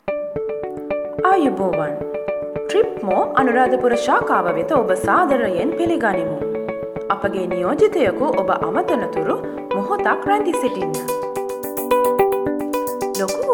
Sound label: music